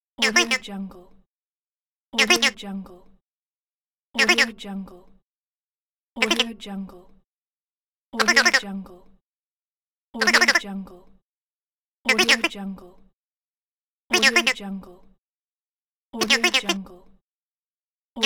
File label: toy duck